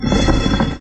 Girder Move

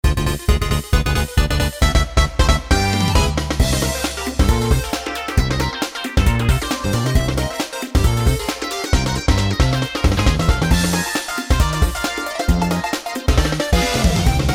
Minigame; paper mario the thousand year door; Pianta Parlor; GameCube

